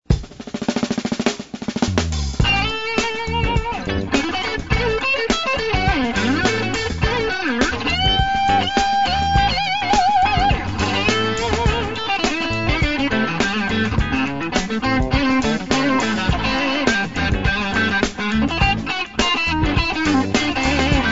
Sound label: guitar blues fusion